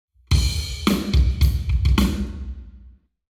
Hall, Drums 0deg; eMagLS

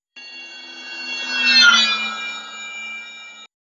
passby pressure total

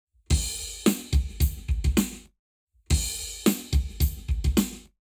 Lab Wet Drums 0 to 360deg

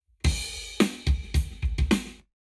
Lab Wet Drums 0deg; eMagLS

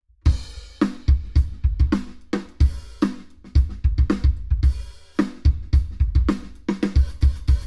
drums binaural

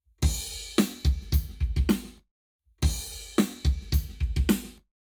Lab Wet Drums 0 to 360deg; eMagLS

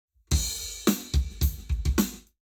Lab Wet Drums 90deg; eMagLS

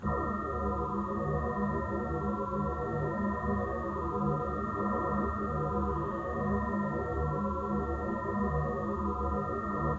3x Multi-Tone AVAS, electric vehicle

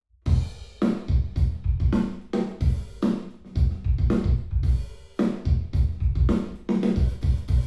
drums, binaural; em32 Aalto

